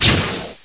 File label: fireball no mana; Heretic II demo; weapons